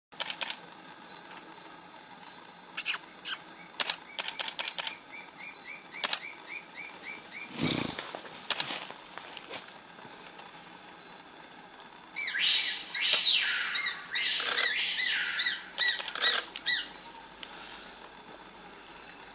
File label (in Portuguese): Canto da freirinha de coroa castanha